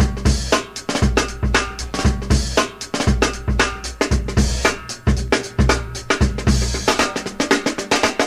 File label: 116 bpm drum groove f key; Samplerate 44100 Hz